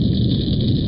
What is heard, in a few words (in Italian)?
sfx reattori; Omnia Junior - Base Terra